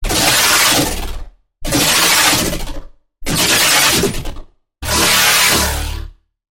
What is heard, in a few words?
Growl; Velociraptor; Routed Sound; Vital Expansion - Chaos Bringer Vol.1